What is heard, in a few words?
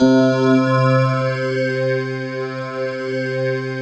World of Synthesizers, SYNBELL